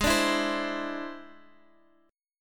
E Augmented 7th Ab, guitar

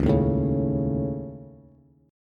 Db Suspended 2nd Sharp 5th, Bass; strummed; Dbsus2#5; JGuitar

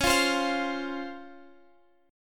C sharp Major 7th

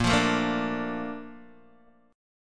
Bb Major 7th, Guitar, Open D; x,1,3,3,1,0

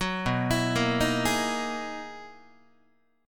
B Major 13th, down, Guitar, BM13 chord; arpeggiated; JGuitar

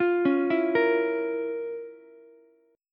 F Major 7th Suspended 4th Sharp 5th down Ukulele; FM7sus4#5 arpeggiated; JGuitar

